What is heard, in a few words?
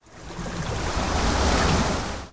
spray change bg audio; effect